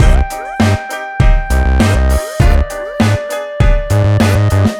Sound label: Hiphop Rnb (Love Flute), ringtones; Google; NewWave Labs